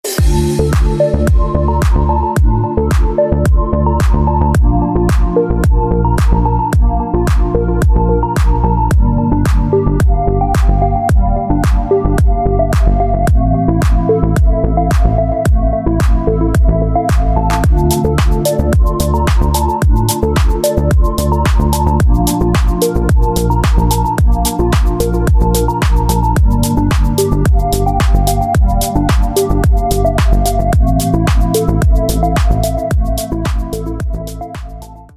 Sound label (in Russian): spokojnaja kosmicheskaja melodija na budilnik